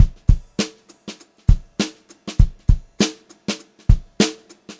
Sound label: VAR, Funk-Groove Drumloops